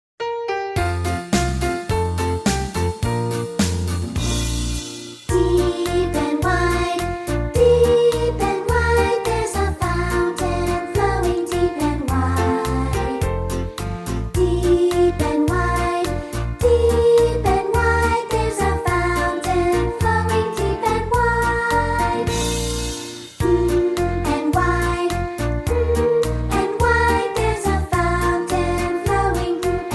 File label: Childrens Choir